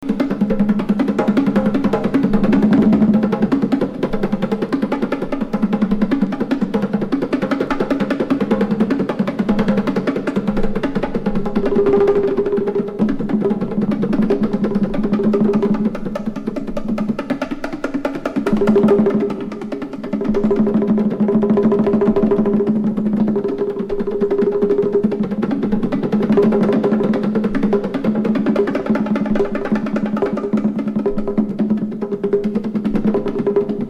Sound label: Rites Percussion Fast